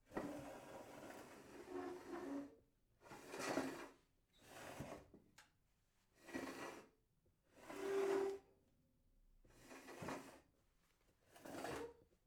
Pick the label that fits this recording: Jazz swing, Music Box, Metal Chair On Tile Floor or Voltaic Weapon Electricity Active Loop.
Metal Chair On Tile Floor